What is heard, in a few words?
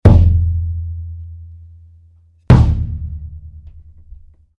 Impact Dull Thud; Tom Hutchings